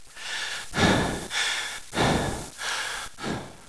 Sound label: run